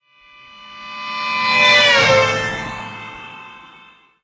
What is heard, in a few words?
car horn; Blind Drive